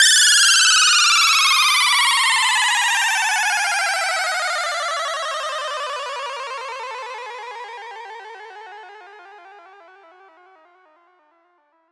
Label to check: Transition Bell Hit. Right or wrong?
Wrong